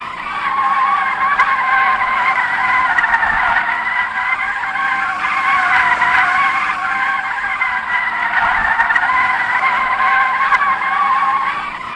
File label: Tire screech; chicken gun; iOS, Android